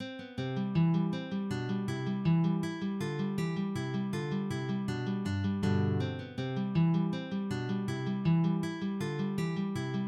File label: contradanzas; Ferdinando Carulli